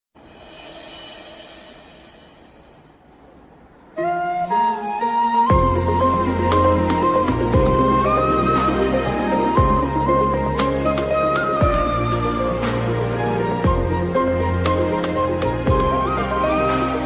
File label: telugu best ringtone love bgm ringtone telugu ringtone bgm; anupama